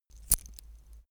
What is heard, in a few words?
metal watchstrap click